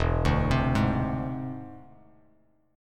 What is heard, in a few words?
E Augmented 7th E down Bass Standard; E+7 arpeggiated; JGuitar